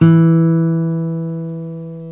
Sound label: GTR Nylon, Nylon Martin